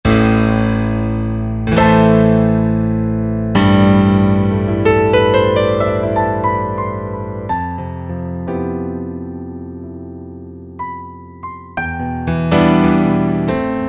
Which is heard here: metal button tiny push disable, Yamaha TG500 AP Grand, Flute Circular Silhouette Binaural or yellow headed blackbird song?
Yamaha TG500 AP Grand